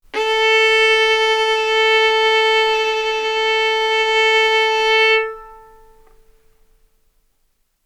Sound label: Violin arco mf sul A A4 B4 stereo